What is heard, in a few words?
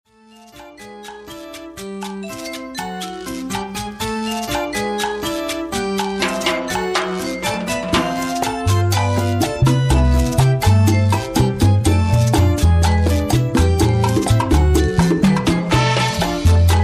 Latin Jazz